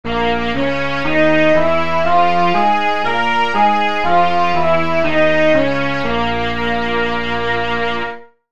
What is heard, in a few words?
bb blues scale